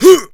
Melee, Melee Grunts, Human Punk; zps; Humans

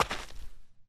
gravel, Footsteps; zps; Foley

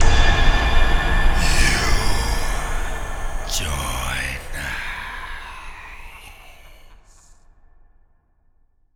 Zombie win; zps

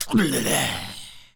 Male Pain, Zombies; zps; Male1

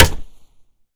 Hammer Nails; zps

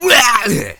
attack, Zombies; zps; Z Punk

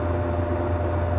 Refrigerator; zps; Ambient

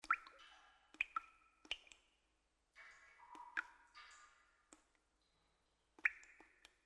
Water drip; zps; Ambient; WaterDripping

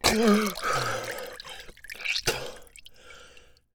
Air, Water, Humans; zps; HM Water

